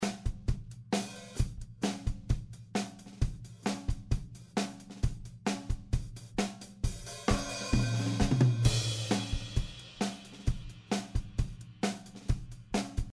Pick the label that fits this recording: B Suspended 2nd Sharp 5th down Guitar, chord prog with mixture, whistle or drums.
drums